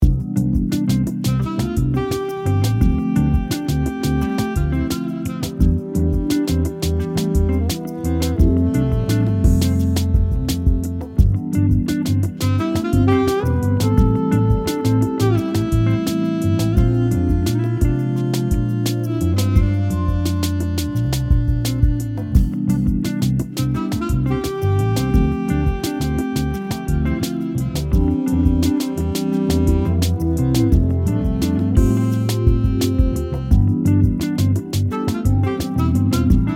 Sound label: Smooth Jazz